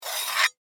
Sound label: Metal Panel Slide, Foley, Metal, Panel, Slide, Open, Small; Slides; Objects